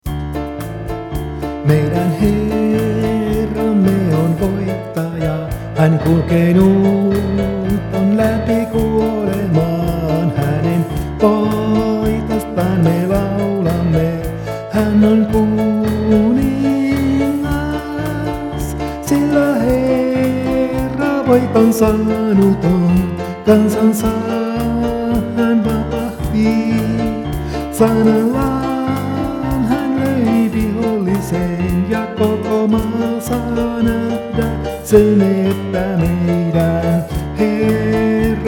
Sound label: LIVE Piano